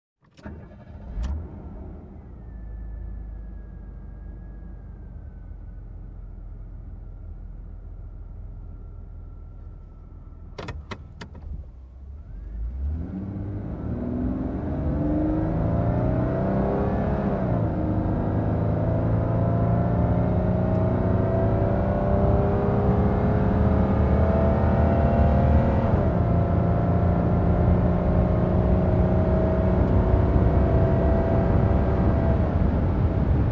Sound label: Driving Sports Car from Cockpit